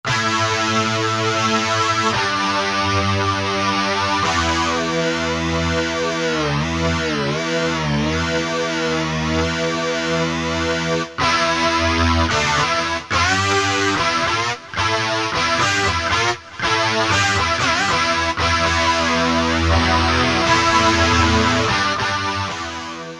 Heavy Guitar, Roland U-220; Factory Patches

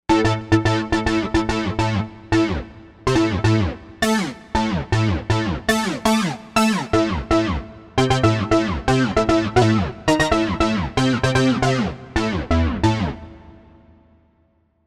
Stab, Novation UltraNova; Factory Patches

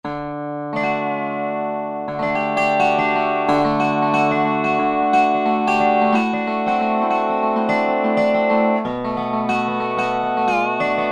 Resonator Gt

